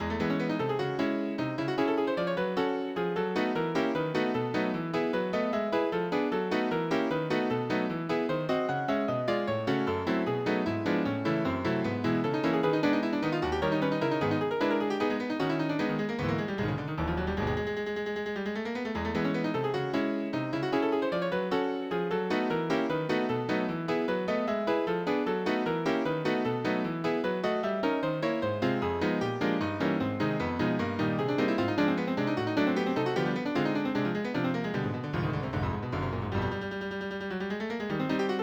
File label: Scherzo in E Major